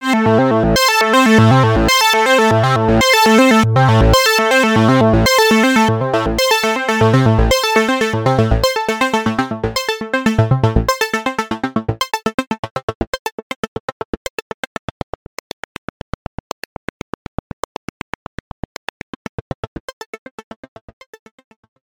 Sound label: GForce Oberheim OB X Envelope Decay